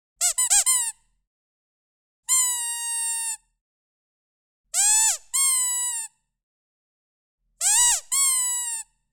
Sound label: Rubber chicken toy squeaky noise squeaking